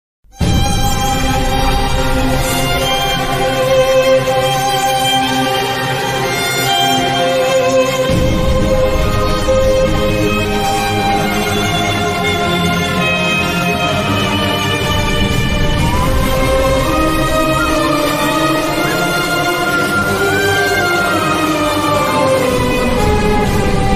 most emotional love bgm mobile ringtone telugu ringtones; raja rani movie